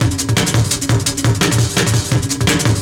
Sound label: Break Beat, Drum N Bass; Sonic Foundry (Sony Creative Software); Knutrix Drum & Bass